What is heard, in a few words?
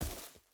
footstep rubber boots grass jump land; Rust